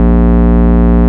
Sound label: Vengeance Ultimate Bass A# Root A#; Vengeance Samples